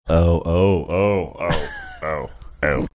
oh oh oh oh oh oh

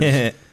heh heh

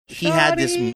singing shoddy

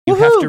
woohoo

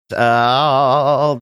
making trying to remember sound

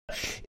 death rattle

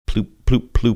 ploop ploop ploop

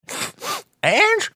sniffing; sniffing saying and barney fife

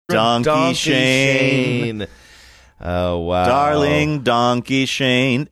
singing donkey

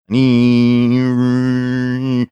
making electric toothbrush sounds

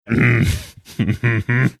deep mmmmmmhmm

